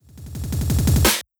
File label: Steppa Fill, Loops, breaks; m8 breaks